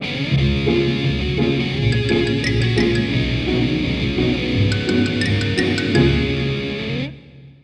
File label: Bari Sax